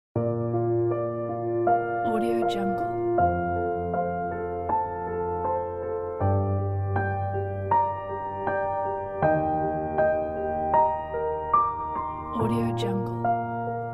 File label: romantic piano logo pack